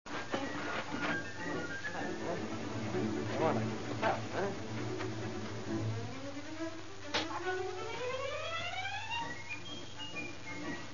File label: whistling drunk